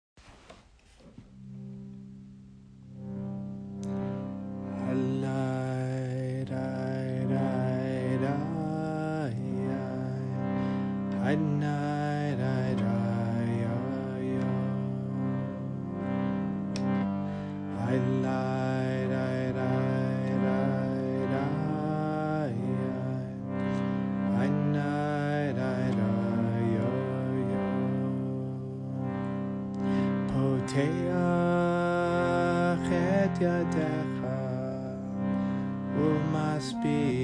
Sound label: pei chant